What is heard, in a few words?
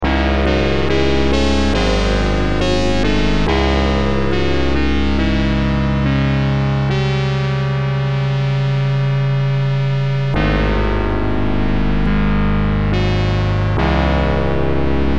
atreides dirge; dune; sega